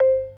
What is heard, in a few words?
PERC F 2; B3 FULL